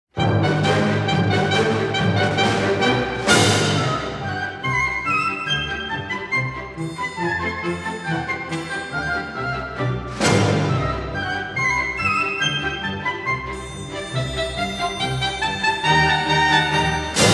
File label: Polka; D.Shostakovych; balet suit 1